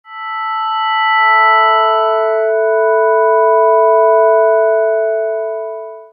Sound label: shock; animal